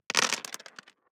DICE on Plastic, Throw and Roll, Standard, 5 Five Dice; Samples; ArticulatedSounds; DICE Sfx Party (Extended)